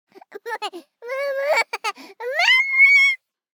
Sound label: BABIES LAUGH Boy 12 months Moving Clothes Mama; Baby Boom